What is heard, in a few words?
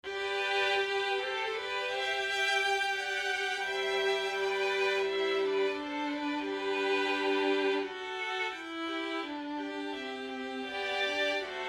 violins; melodics; Red Room Audio